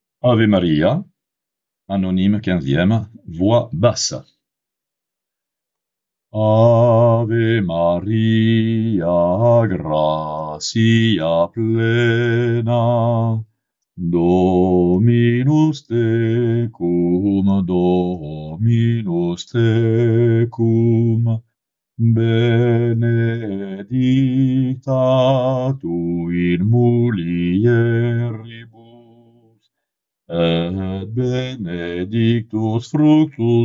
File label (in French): basse; Ave Maria anonyme XVeme